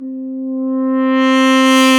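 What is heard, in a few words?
BRS F HRN, French Horn Swell; Roland L-CDX-03 Disk 2; F.Horn FX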